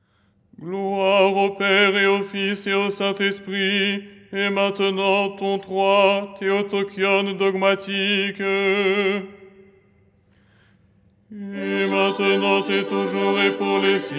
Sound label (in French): basse; ton 3